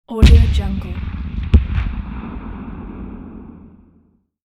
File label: cannon shot